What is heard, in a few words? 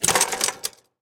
obj rattle; tanks; War Thunder